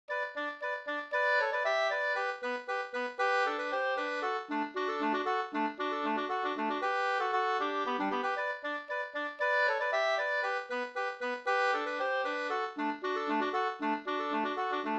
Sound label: polka; Finska polkan